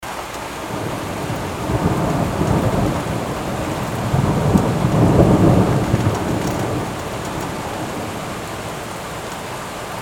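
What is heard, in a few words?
sound of thunder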